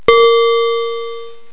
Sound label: BELL NOTE